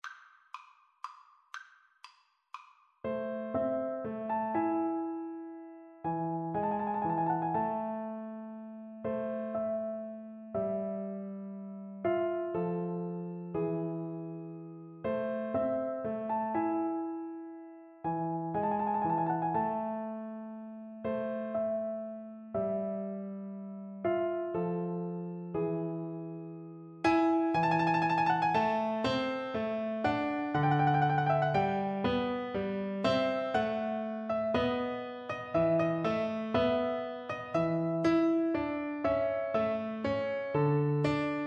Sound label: corelli concerto grosso FL kar, Flute